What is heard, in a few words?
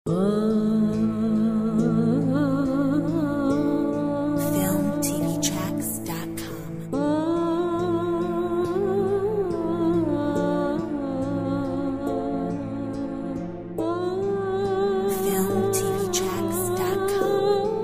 Middle Eastern Voice; Filmtv-tracks